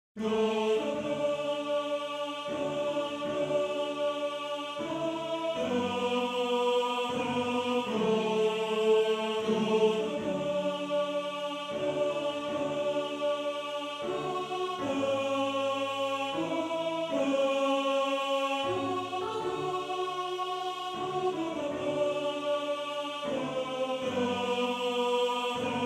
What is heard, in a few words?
Tenor; Tenor 2